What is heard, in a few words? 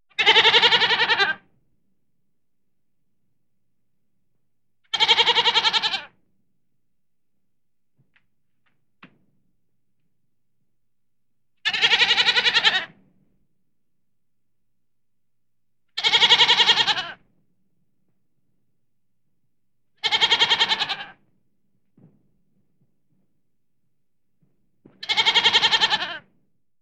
Lamb bleating repeatedly